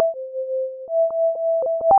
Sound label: melody